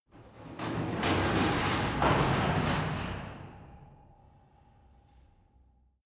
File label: garage door close interior